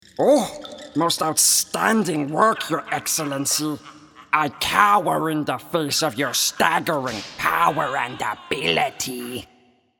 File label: Torture